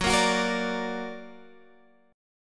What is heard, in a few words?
Gb Augmented, Guitar, Standard; strummed